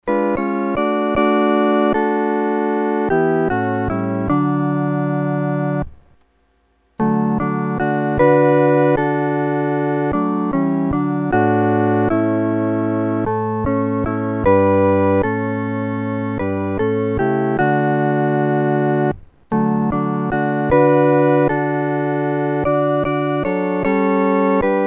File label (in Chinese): 合奏 四声部